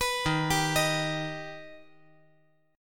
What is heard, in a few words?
Eb Augmented Eb; arpeggiated; JGuitar